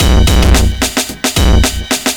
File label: block rock kit